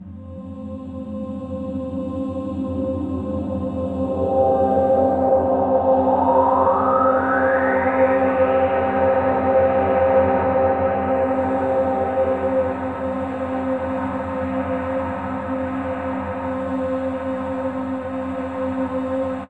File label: Pad; Dream Zone